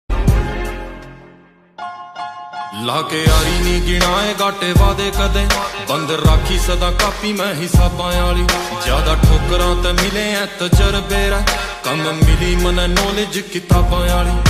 haryanvi ringtone

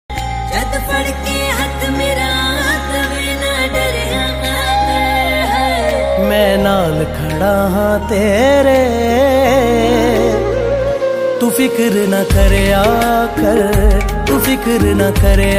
punjabi song

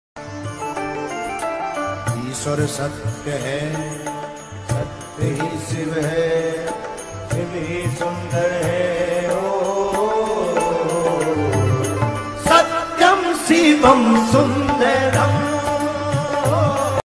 only music ringtone; satyam shivam sundaram; bageshwardham

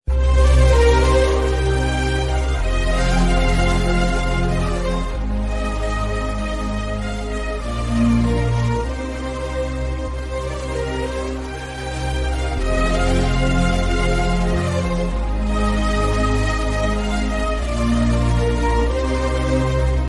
background music instrumental music; telugu movies bgm

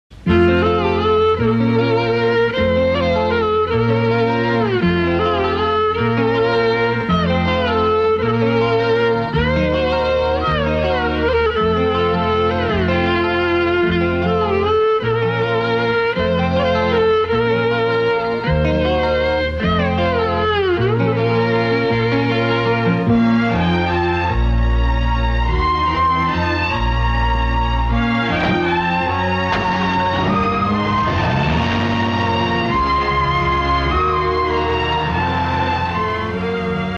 mass bgm; annan thambi; rajinikanth

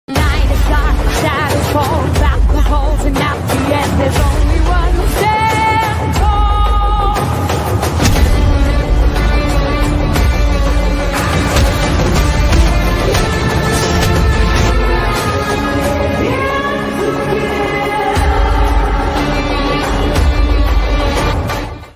kaithi bgm

